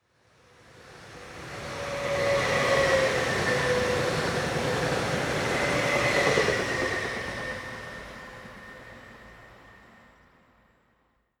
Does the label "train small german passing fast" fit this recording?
Yes